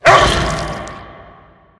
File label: attack; smite; god scylla